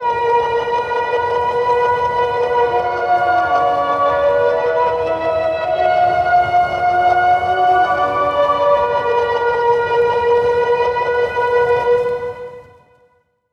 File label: fx pitchy reverse piano A; CAPTAIN CUTS